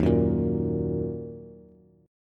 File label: Eb Minor Eb Bass; Ebm strummed; JGuitar